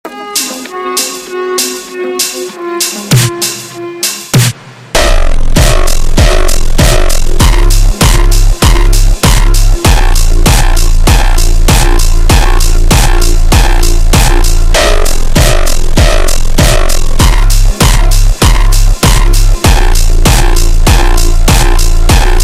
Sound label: Super Slowed, funk